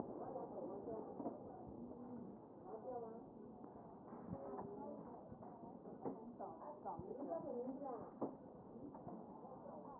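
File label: posterior chest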